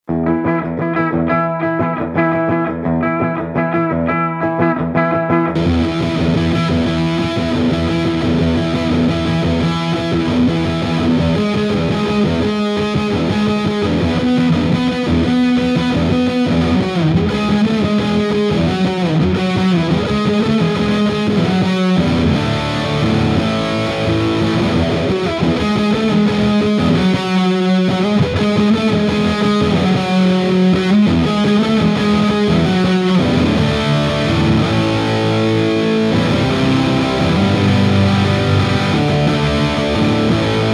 MUSKET AND STRAT

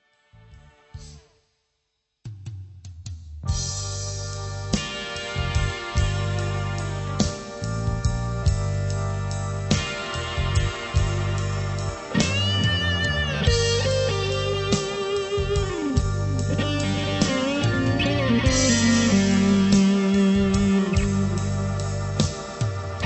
Overdrive

